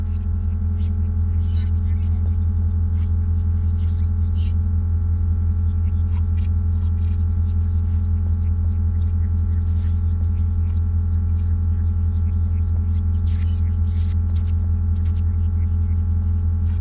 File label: capsule, ships rooms; CosmicRage